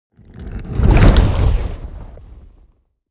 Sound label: whoosh fire lava wave medium